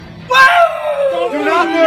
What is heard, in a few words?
weeeooowwwww